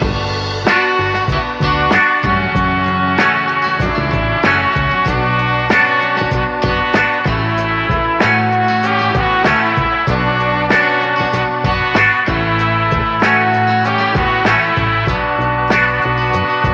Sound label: horns and guitar scratch, Loops, Phonky